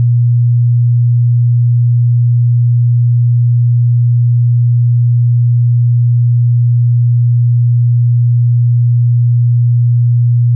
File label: 120Hz